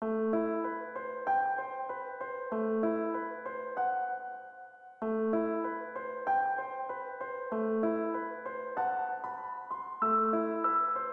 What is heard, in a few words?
Instrumental; Toho Complete Box; Touhou Silver